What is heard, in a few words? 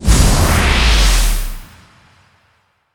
re spins; dazzle